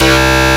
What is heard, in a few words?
BS, Wave Bass